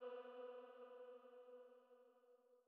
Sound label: Female Pad B2; KG MegaSaur